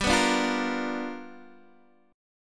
G 7th Sharp 9th G chord, Guitar DADGAD

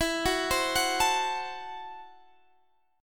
C 6th Flat 5th E

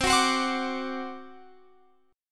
C Suspended 2nd Flat 5th C down Guitar Open F; Csus2b5 strummed

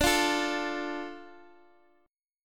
D Minor D Guitar Open G

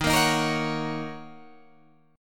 D sharp Minor 7th, Guitar, DADGAD

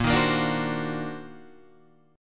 Eb Minor Major 13th Bb

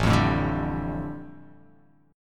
A 7th Suspended 4th Sharp 5th, A, down

